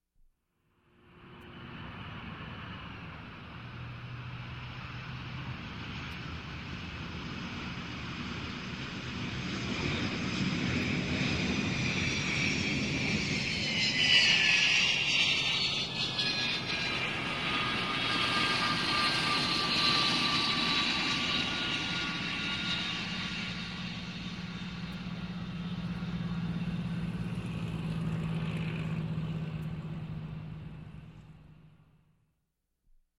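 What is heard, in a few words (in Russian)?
шум самолета на взлетной полосе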